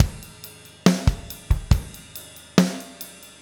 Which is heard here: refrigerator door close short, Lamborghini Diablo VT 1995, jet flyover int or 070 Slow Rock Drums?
070 Slow Rock Drums